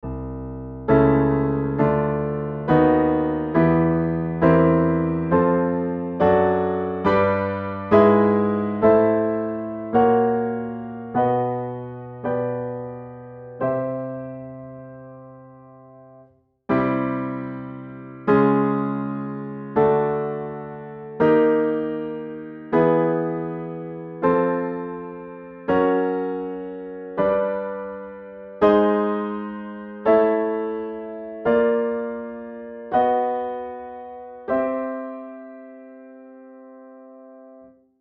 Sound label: equal chromatic, tuning samples